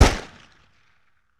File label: Pistol Shot With Echo